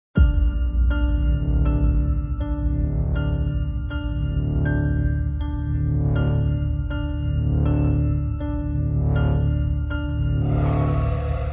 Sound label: Horror Trailer No Whispers